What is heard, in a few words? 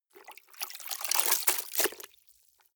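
clothes water up down medium, household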